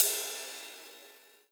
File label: cymbal; gameduino; drumkit